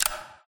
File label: switch button; ProSoundCollection v1 3 MONO Switches Buttons Gears Levers; switch button push on off